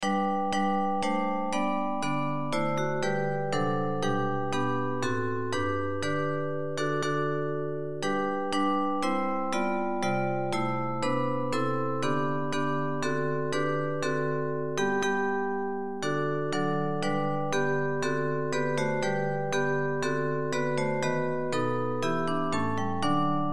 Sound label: Bells